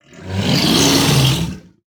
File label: Shell Shifter Taunt Attack